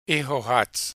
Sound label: laugh